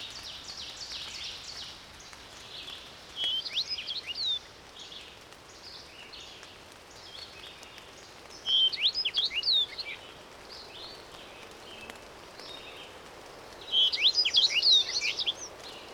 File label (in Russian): ovsyanka remez